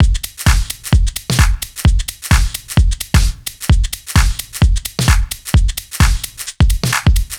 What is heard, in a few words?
Solid Loop 130, Loungin House; Equipped Music